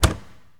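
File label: Ferrari Testarossa Hood Close, Automobiles; SFX Kit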